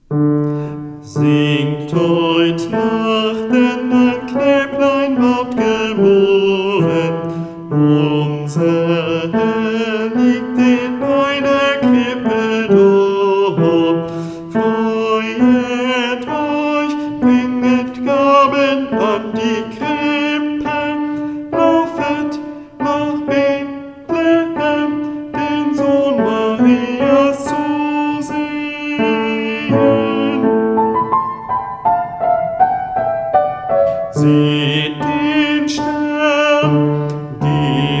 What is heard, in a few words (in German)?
Tenor; Sternen Carol; adventsmusik